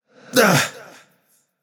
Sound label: grunt hit, voicepack; smite; vamana